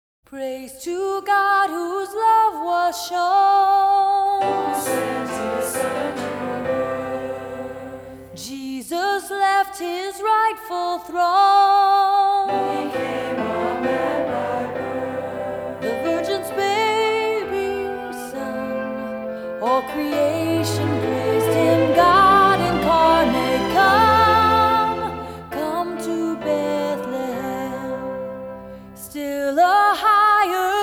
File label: Christmas Hymn